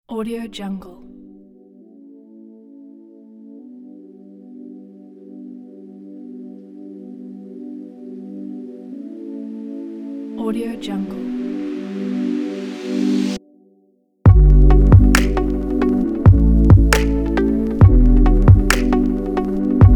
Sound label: chill trap